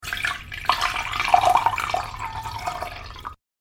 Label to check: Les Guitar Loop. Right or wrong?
Wrong